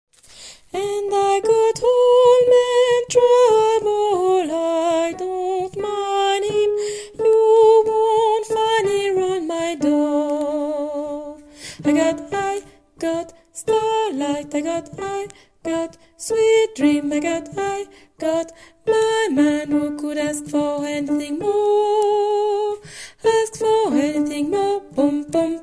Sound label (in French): basse